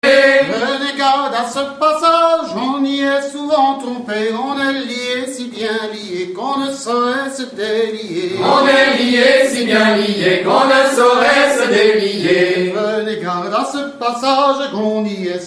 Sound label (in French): chants et musiques a danser en loire atlantique; bal paludier